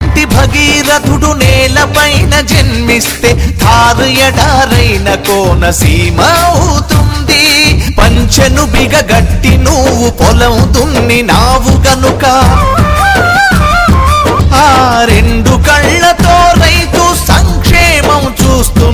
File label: Ring Tone; Ysr